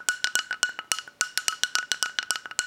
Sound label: bell